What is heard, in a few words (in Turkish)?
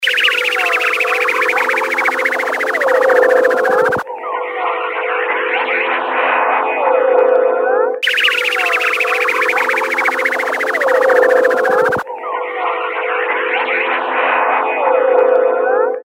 Ufo Saldirisi